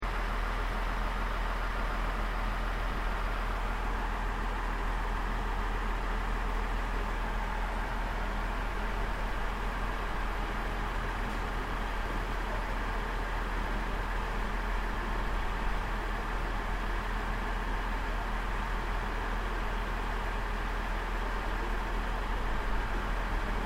Train JR Regional Aomori Unknown Northern Idle Loop, Japanese Trains; Train Sound Effects; Vehicles